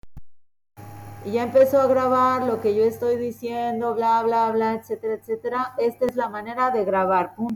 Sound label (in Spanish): dron